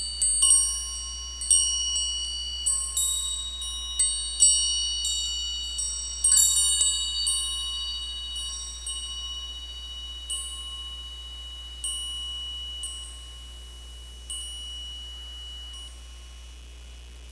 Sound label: chimes